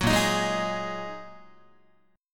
Bb 7th Suspended 4th Sharp 5th Bb Guitar 4A,4E,5A,5D,5G sharp,6C sharp; Bb7sus4#5; strummed; JGuitar